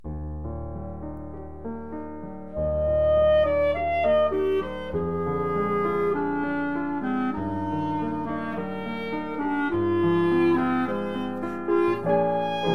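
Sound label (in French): brahms, clarinette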